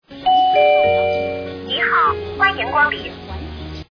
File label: ding